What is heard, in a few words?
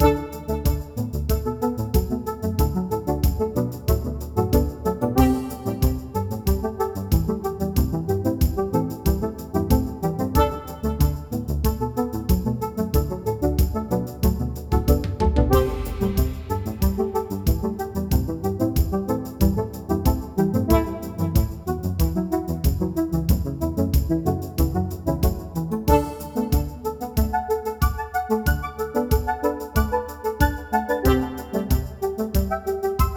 Whimsical Street, funny